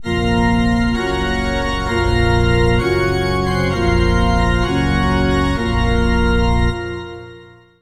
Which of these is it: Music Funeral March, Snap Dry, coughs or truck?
Music Funeral March